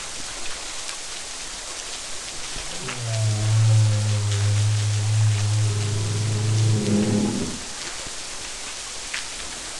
Tonal Call

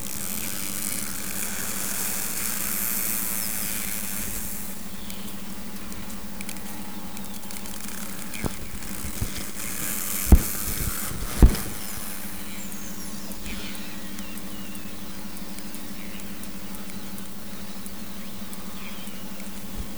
Arnoux beaked whales

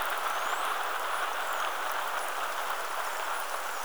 Globicephala macrorhynchus Whistles

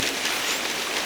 Orcinus orca

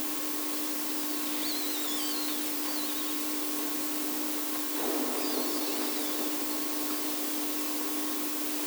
Arnoux beaked whales

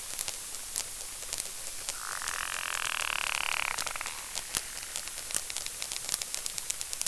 burst pulse bullfrog click train

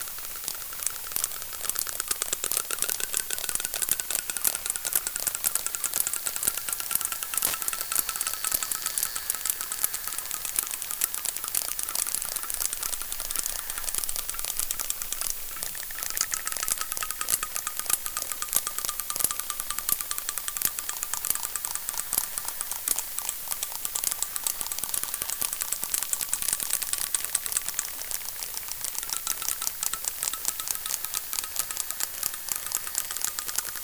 click trains tic tocs and clicks

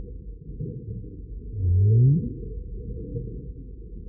Southern Right Whale Upcall

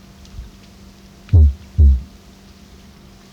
Pygmy right whale

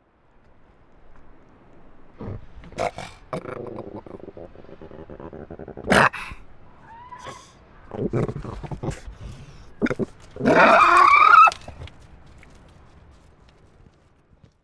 NZ fur seal Growl with Guttural threats

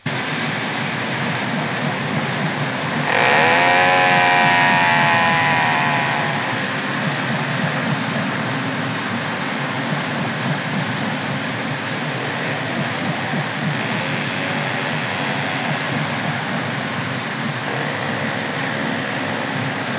crabeater seal

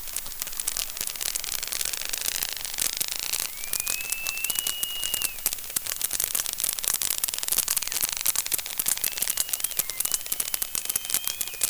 clicks and whistles Rattelsnake click train

